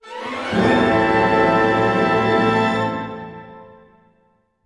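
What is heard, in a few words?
orchestra; Masterbits - Switch FX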